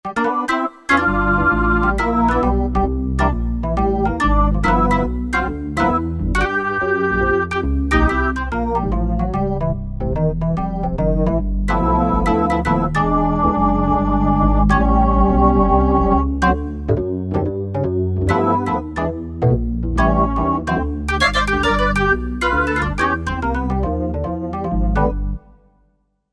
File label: Korg MSC-9S Organ